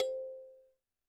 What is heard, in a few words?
percussion, Roland TD-20